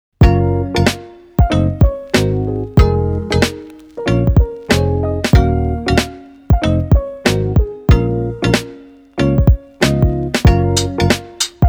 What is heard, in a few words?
Engine